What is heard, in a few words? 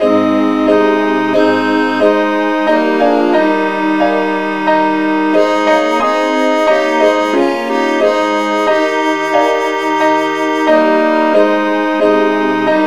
satb key aes